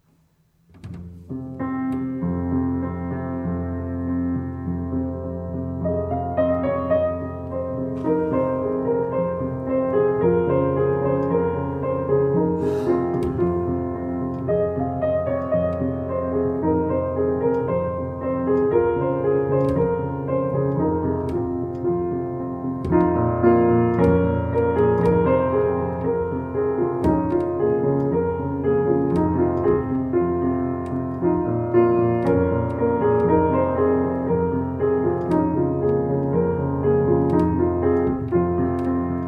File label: Tibetan Dance